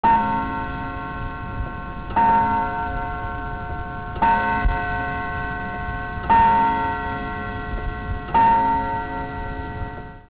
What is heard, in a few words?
clock